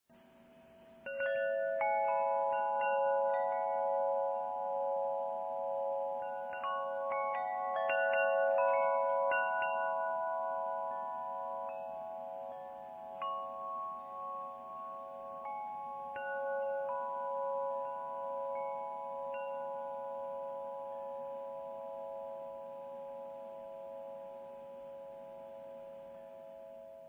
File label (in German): klangspiel sol